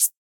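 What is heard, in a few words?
Edm closed hi-hat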